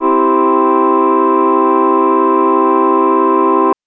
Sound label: Synth VoiceOh maj c3; TrashLibrary